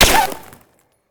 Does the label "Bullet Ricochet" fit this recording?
Yes